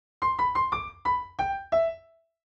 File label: piano